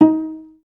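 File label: cellos pizzicato D#2; Best Service; Peter Siedlaczek's Advanced Orchestra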